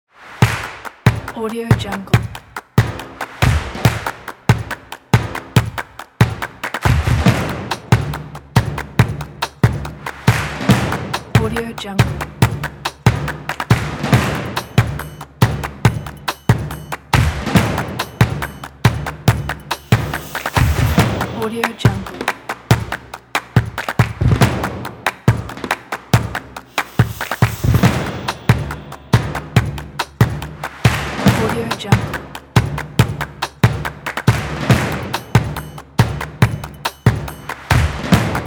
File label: upbeat percussive